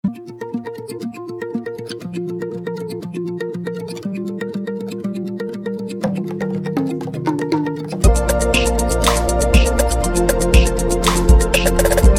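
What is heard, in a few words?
Golden Whistle 120 A major; Aetherpop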